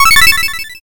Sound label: oneup